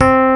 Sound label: RHODES C4; E-MU Formula 4000 Series Vol. 5; Protozoa